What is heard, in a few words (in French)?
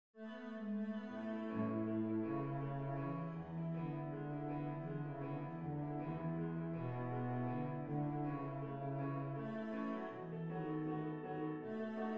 menuet quintette cordes; boccherini luigi